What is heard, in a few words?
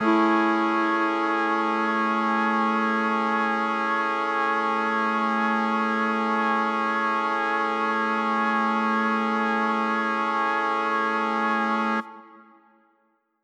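Mixed Winds A major 7, synth orchestra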